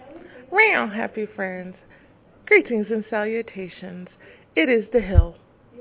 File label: meow